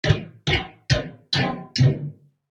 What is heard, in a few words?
5 Clicks Futuristic Foley; Futuristic Foley Sfx; Click Sound Effects